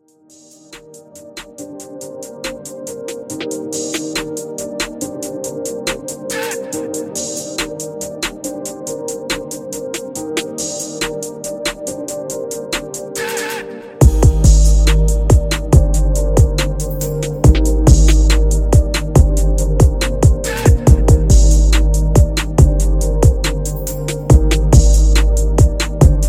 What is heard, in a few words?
trap beat 140 BPM